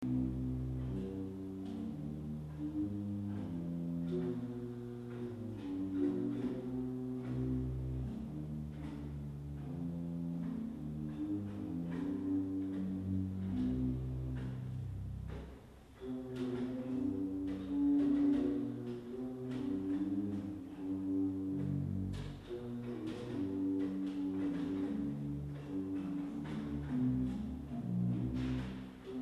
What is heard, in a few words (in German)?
Viol 16, Orgelstimmen